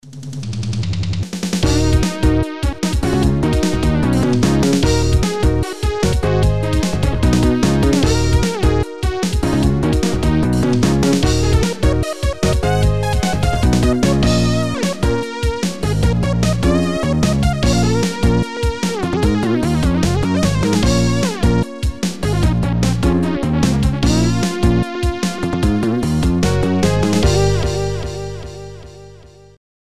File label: Paragliding Crash, Amiga